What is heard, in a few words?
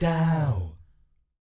DOW, Vocal Jazz FX; Spectrasonics; Vocal Planet; JAZZ COMBO